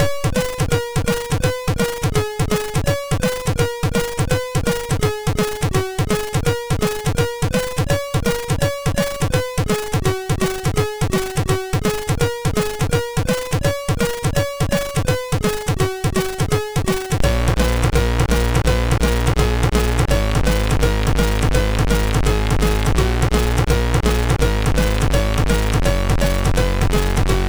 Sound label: Xmas Rock, sid MOS6581R3